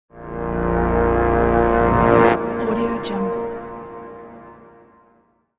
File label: war horn alert